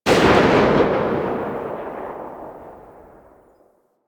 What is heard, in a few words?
AK47 Tail; Warframe; Tenno; Weapons